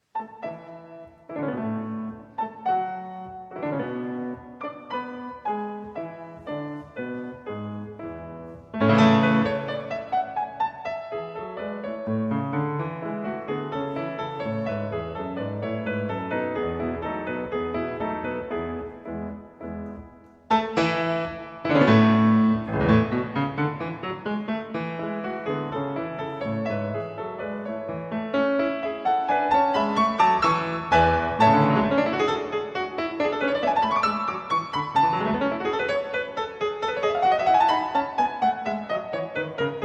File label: Piano Sonata No. 2 in A Major - Allegro Vivace